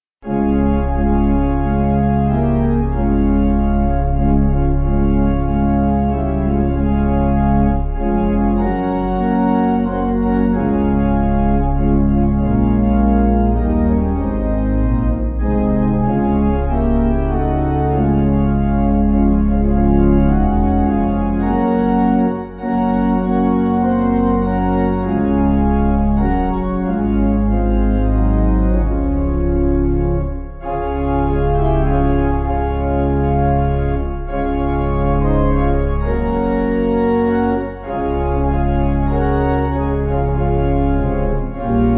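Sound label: Pipe Organ, Music Backing; Fear Not Little Flock; Rader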